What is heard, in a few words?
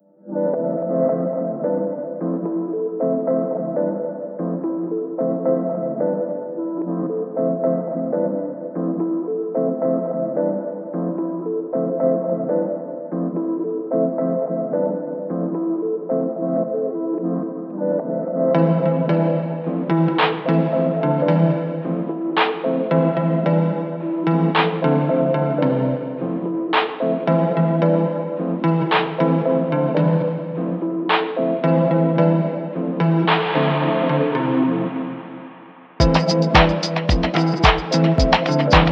Garden loop; Frogger in Toy Town